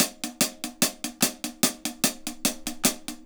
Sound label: HAT, HI HAT LOOPS, Dub; Drumdrops In Dub VOL-1